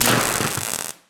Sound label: explosion robot buzz